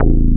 Ind Bass, Synth Bass One Shots; Basses

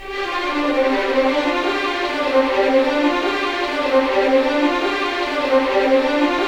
RIVER; East West; Scoring Tools; ORC; River Flow

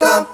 Hip Vocal Kord B, Vox One-Shots

